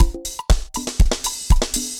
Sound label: dancefloor perc 120 bpm